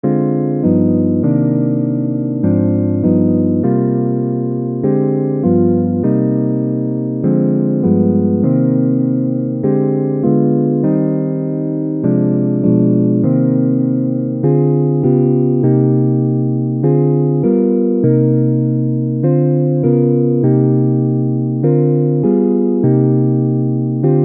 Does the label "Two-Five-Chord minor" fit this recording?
Yes